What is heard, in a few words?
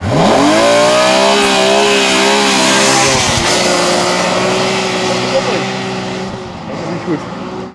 clutch